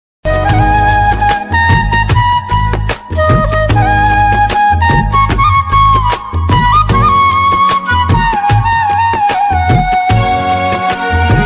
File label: instrumental ringtone, old is gold ringtone, piano ringtone